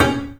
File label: PICK D3; InVision Interactive; The Piano